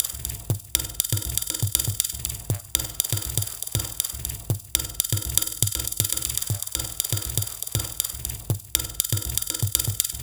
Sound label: Minimalism, Processed Percussion